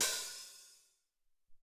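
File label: Open Hat, Alesis SR-18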